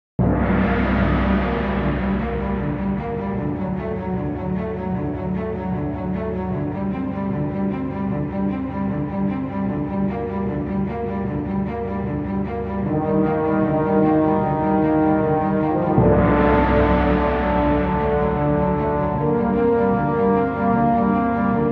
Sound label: music stream spooky